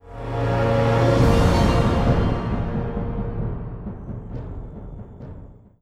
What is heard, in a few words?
Stinger